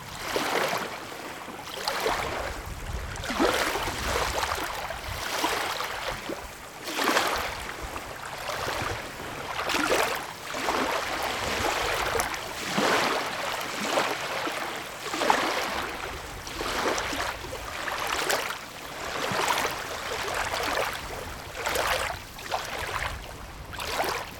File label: Light Lake Waves With Wind